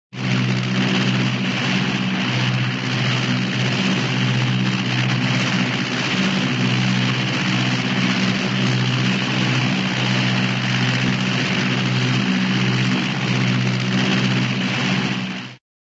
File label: airplane, squadron prop squadron in flight; cartoon